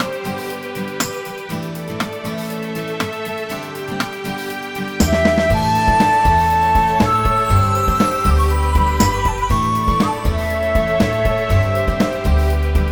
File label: Grasslands Loop; Eternal Radiance